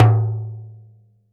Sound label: L TIMBA OP, TIMBALES; AKAI S6000 CD-ROM - Volume 5